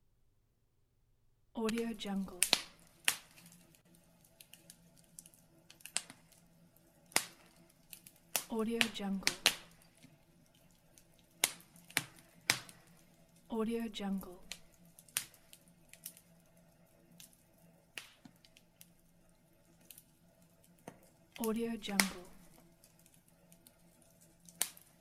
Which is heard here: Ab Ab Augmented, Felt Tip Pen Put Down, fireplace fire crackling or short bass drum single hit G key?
fireplace fire crackling